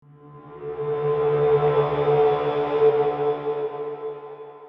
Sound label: suspense; Suspence (2); Bruitages